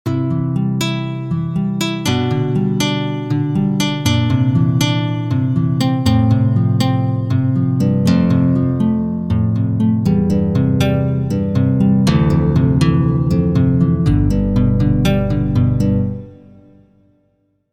Nylon Guitar

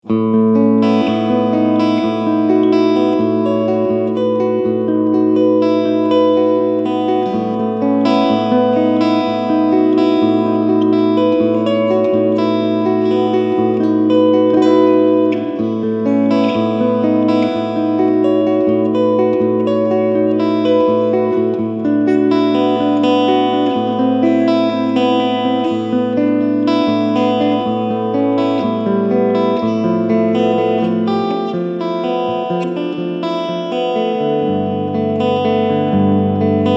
Harley Benton Streetbox 60 Reverb

